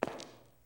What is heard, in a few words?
tile, Footsteps